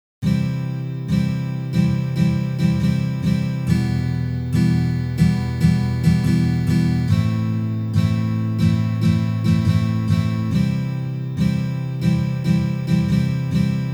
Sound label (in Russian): boj